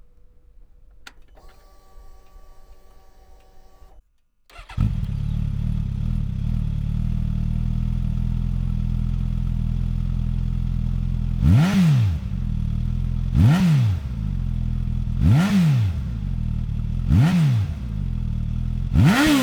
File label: engine sound, gsx-s1000